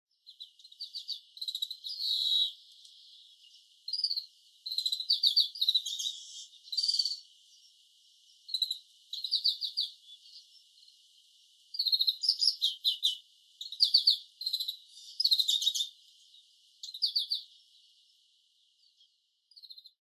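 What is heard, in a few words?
oriental greenfinch, date; kawarahiwa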